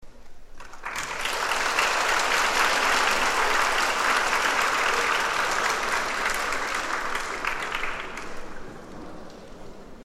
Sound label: clap